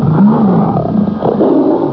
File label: growl